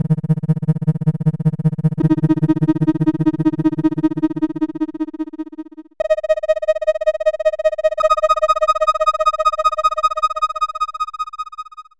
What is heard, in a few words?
Supersine; Retro Noise 3 Instruments